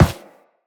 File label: Operator Run Metal, Footsteps; Characters